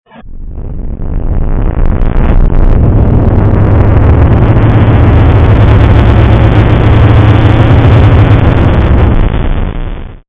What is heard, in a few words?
ping ring